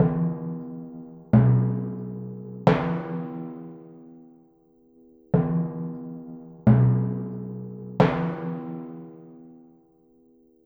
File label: Timpani, Cinematic; Sonic Foundry (Sony Creative Software); Orchestral Series VOL-3 - Cinematic